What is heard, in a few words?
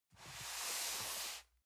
cardboard box medium slide soft; objekte